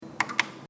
Geldautomat Eingabe

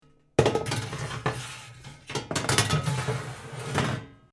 Herd Blech einschieben

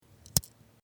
Taschenmesser

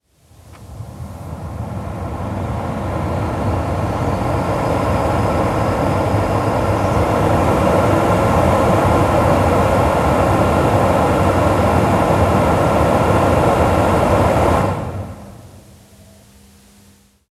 Auto Luefter Frontscheibe

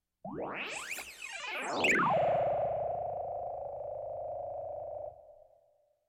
Science Fiction Energiefeld Entladen

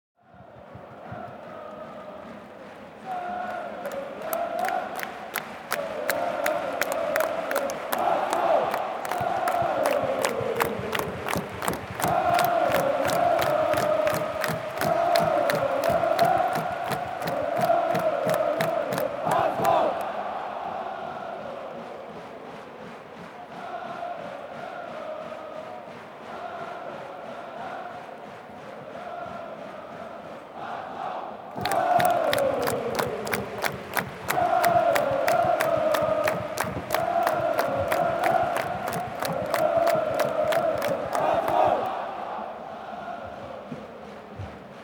Stadion Fans und Gesaenge

